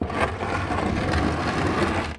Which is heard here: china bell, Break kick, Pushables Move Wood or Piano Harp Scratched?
Pushables Move Wood